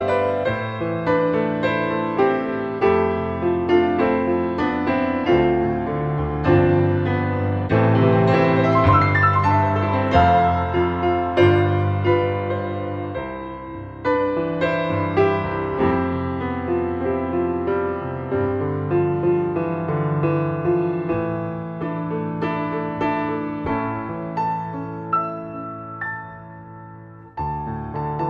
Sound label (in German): Ueberleitende Improvisation am Fluegel